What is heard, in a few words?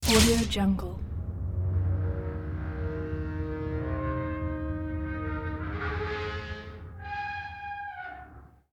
searing metallic impact zappy laser blast; برخورد لیزر به فلز; industrial sounds